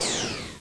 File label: Poosh